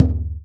BASS, INDIAN PERC

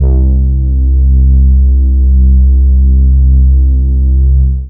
Single Electric Basses, Dance Floor Bass

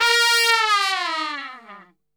A#2, Brass; Best Service; Brass Super Section; TRP LNGFLL

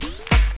SCRATCHES

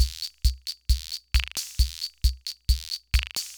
Loop, sr-95; classic machines

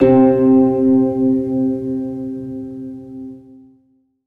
Pluck 8 - C; 99Sounds; Monosounds; Music Loops; Instrument Oneshots

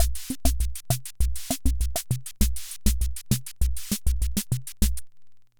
Hammond Auto-Vari 64 Loop, drum machine

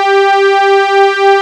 SPACE WAVE, PAD; S.PAD

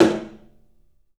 TOM, 3D Drums, CLUB TOM; 3D Sound; CLUB 08TOM

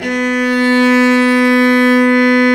STR Vc Arco p

